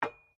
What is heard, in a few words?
SLEDGEHAMMER METAL IMPACT LIGHT; Working with a sledgehammer; Hammers; Tools